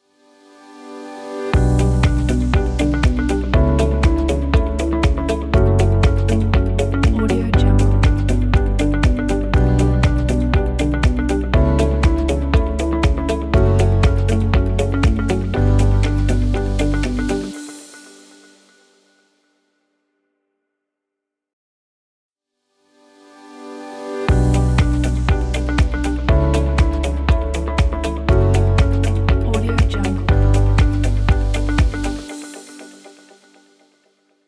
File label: corporate intro logo